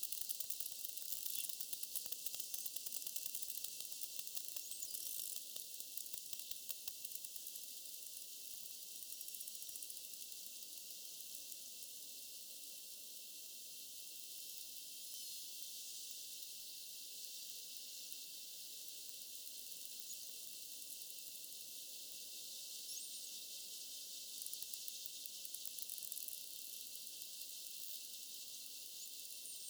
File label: spinner dolphins